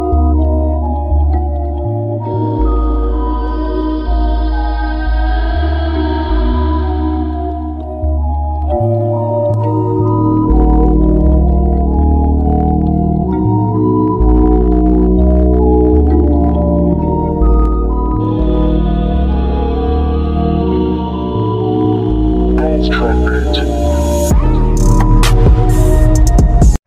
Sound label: english sweet ringtone